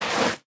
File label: Death Drag; smite; izanami